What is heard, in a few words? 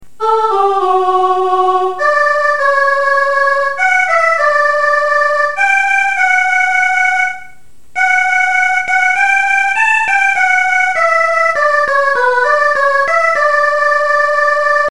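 first nowell, soprano descants; Christmas; carol tracks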